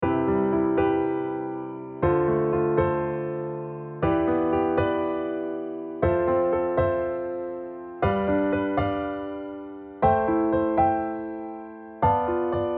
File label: diatonic LH R5